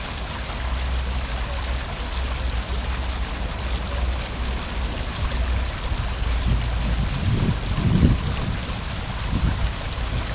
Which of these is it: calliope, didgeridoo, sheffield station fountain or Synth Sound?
sheffield station fountain